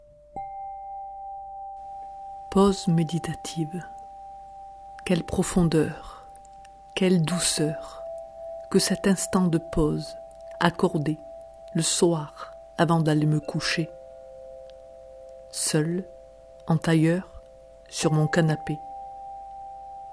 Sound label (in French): Bonheur pause meditative PAD